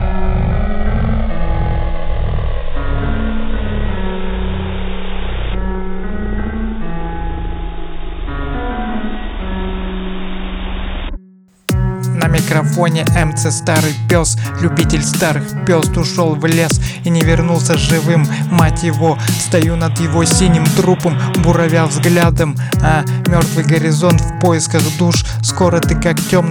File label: abstract satanic rap